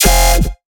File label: F minor Growl Bass 50BPM